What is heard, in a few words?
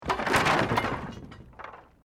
Wood Collapse